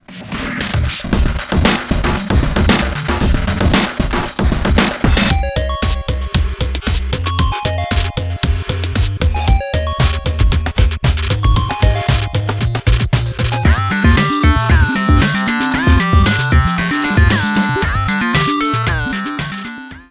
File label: 8-bit